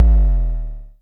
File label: EBASS, Dual Percussion Synthesiser; Klone Dual-Percussion-Synthesiser